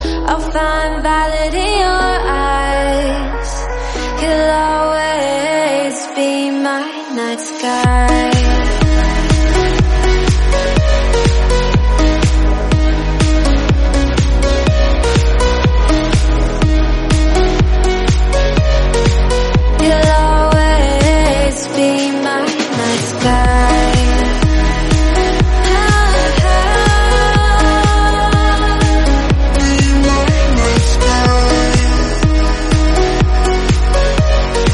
female song uplifting music

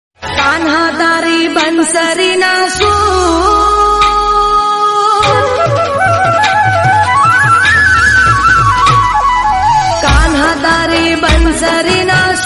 bansari song

